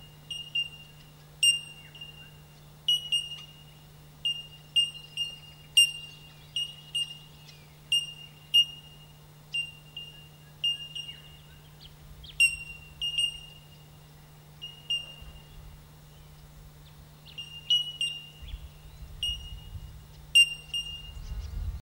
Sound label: bellbirds australia